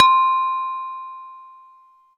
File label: Synth Bells; Dream Zone